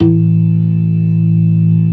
ORGAN SYNTH; InVision Interactive; Keith Emerson

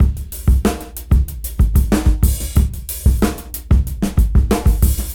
Busy Break 93 BPM; AMG - Keith LeBlanc Phat Butt Jams

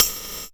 percussion, Dance Synths; Mad Kit

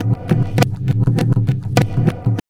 Pure Tabla, 100BPM; Zero-G

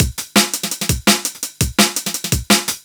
October Break 168, breakcore

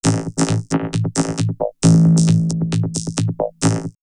Vocoder Loop; X-Static Goldmine; VocoderLoops

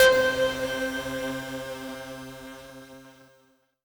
Synth C, Synth Prophet One Shots; Electronisounds

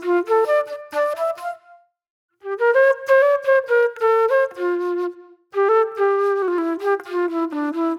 Reflective Flute

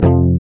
GUI F, Acoustic

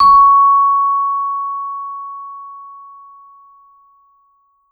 vibe med ff C#5, Marimba & Vibes; Sampleheads; Dave Samuels

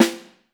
SN1MF, orchestra hits; Best Service; Peter Siedlaczek's Advanced Orchestra

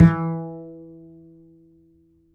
G, G string upright bass; Upright Acoustic Bass

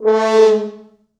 4 FrHorns FXMOD; East West - Quantum Leap Horns Sax; 90's Sample CDs

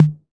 606 Tom

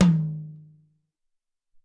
Md Tom, Alesis SR-18

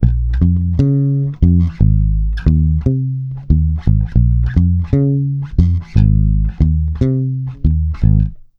salsa, bass; Sampleheads; Ultra Freakin' Bass; 116 BPM

